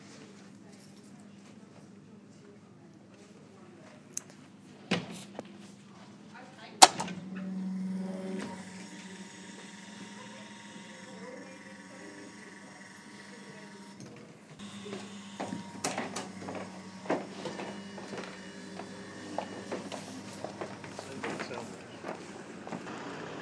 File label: city hall door